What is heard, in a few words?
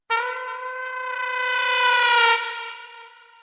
comedy trrrrr